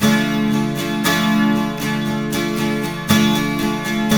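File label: 12 string minor D#, guitars; Spectrasonics; Hans Zimmer Guitars VOL-1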